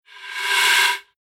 Emergency Fire Brigade Fire Extinguisher CO2 Gas Single Blast Slow Build Release Squirt; Flame Burn